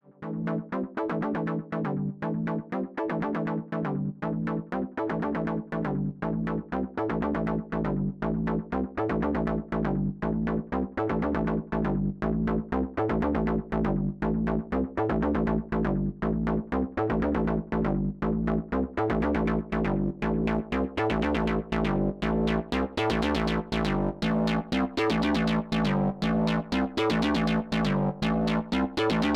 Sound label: dreadbox Erebus V3 3OSC Chord Triple Sync